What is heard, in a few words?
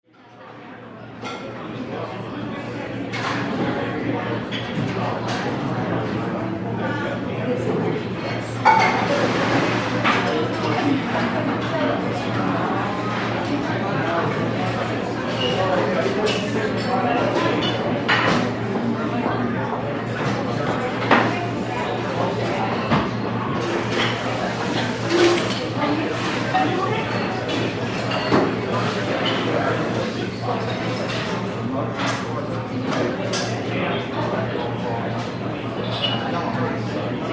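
sounds of feast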